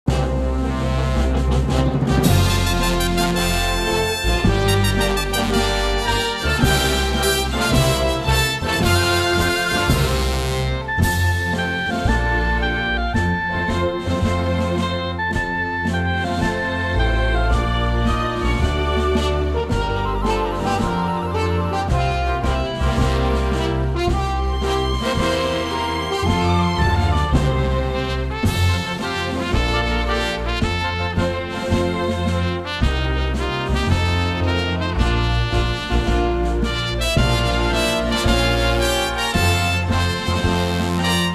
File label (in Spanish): himno instrumental; san jose